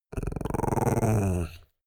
chihuahua growl

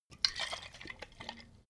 bottle vodka shake single; berufe

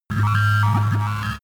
signal interference; soundpool

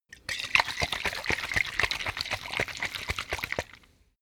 bottle vodka shake fast; berufe; gastronomie

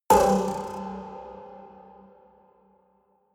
haunted strings buzz high; hits explosionen

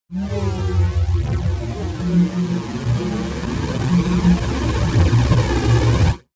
phasing wobble swell; risers

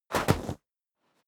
step snow jump

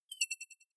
click decay high

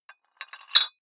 dumbbell put on very small weight hit

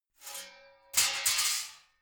metal sheet crash hard long; metall

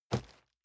cardboard box medium place; objekte

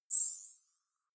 navigation point

